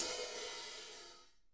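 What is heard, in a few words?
hats open; Sonic Reality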